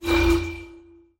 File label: tone